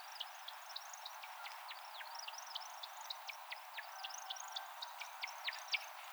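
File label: American Woodcock twittering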